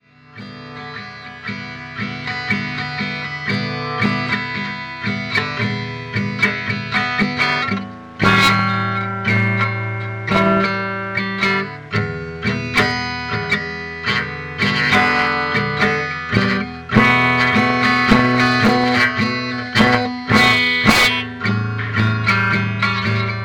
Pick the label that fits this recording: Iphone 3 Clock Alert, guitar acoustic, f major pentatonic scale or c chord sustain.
guitar acoustic